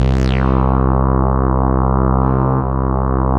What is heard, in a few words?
C3, SynthLine; 90 s Sample CDs; NiceBeats; SynthLine VOL-2